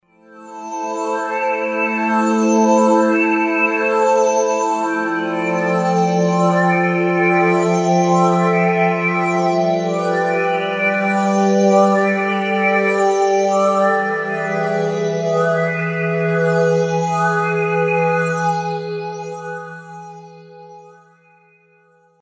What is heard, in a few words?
Pad: mellow filter, Emu Xtreme Lead-1